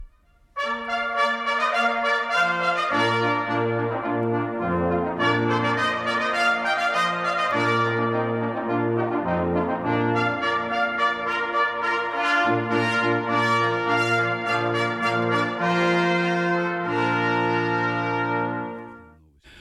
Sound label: Fanfare Orchestra - Intrada